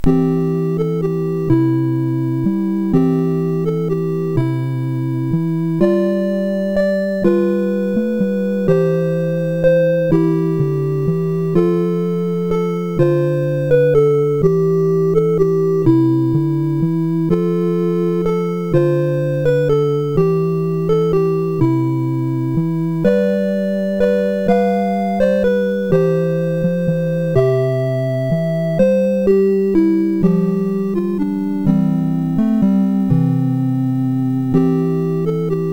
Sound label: Silent Night sid MOS6581R4